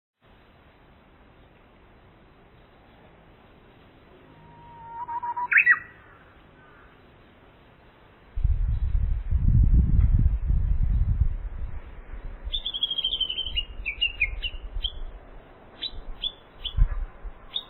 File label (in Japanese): uguisu